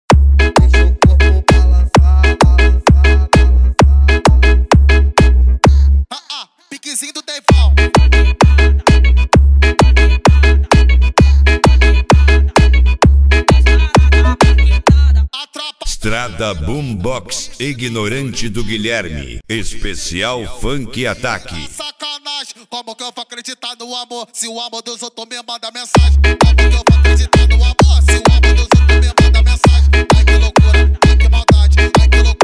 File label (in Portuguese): esp funk atack